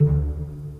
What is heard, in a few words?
soft tap, String; Notes